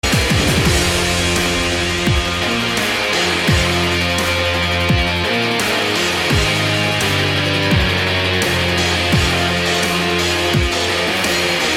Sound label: Eurobeat